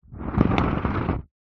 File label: vehicles car tire scrape drag ground